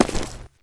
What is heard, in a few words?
tile, footsteps; player